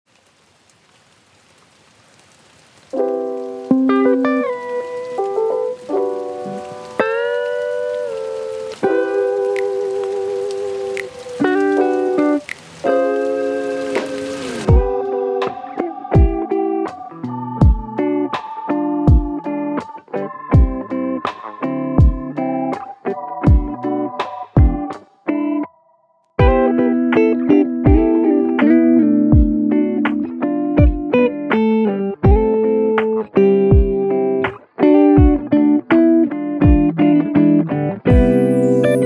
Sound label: rain sounds